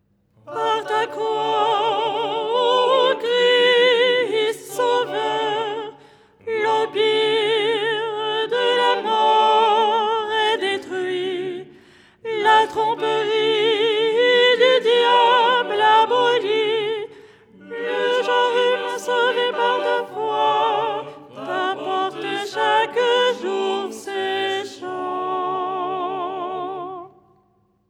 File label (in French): soprano; ton 3